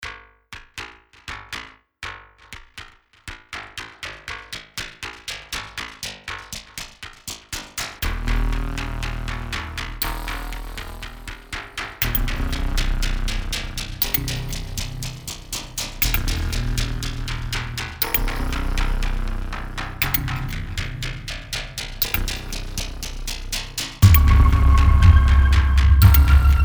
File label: Drums